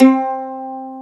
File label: Mandolin C3; Planet Earth; Digital Sound Factory Planet Earth